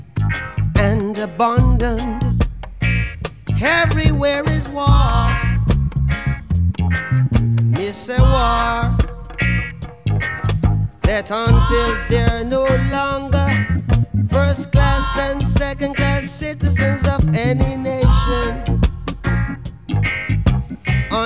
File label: reggae